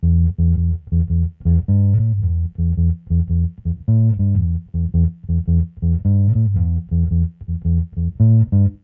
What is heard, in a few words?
Bass Blued; Billy England